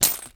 coins bag

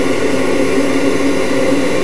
turbine; turbine1; golgotha

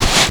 dry sand loose, footsteps; creatures

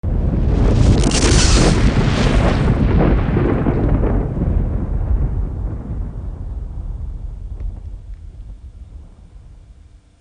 super mortar, explosion; golgotha

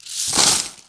coins bag

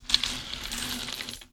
coins pickup

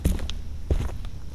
Footsteps on wood; creatures; sasquatch

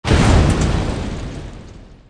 ground vehicle, explosion; golgotha

